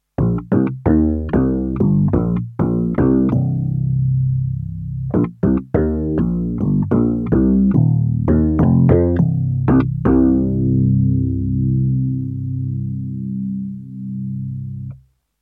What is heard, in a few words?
DX7IIFD Skweek Bass